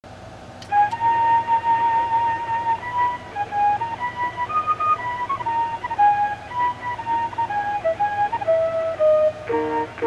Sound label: chime